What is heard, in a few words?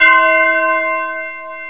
Tubular Bell C5; Hexen Demo; Hexen Music; Hexen 6vox